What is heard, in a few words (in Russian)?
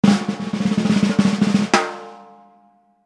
barabannaja drob korotkaja